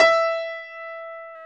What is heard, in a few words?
PIA HIGH G, Grand